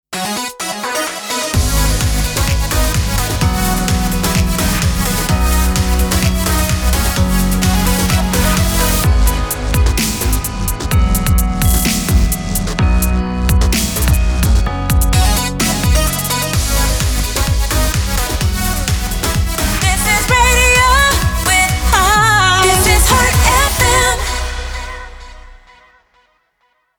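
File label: Sung Jingles B major 128bpm RAMP; 79.5 Heart FM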